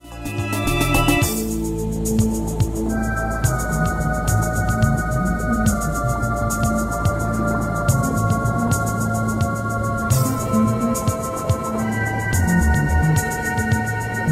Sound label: Underground Theme